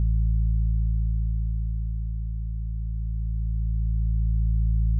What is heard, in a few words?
ambient loop; Ferrous Solitaire